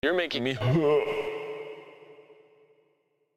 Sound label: huuuegh